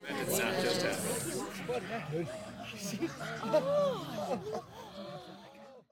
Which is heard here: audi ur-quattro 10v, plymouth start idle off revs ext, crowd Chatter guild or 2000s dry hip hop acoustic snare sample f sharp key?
crowd Chatter guild